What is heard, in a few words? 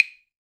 Clave Hi, Perc; Akai MPC500